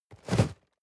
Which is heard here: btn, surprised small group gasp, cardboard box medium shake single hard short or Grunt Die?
cardboard box medium shake single hard short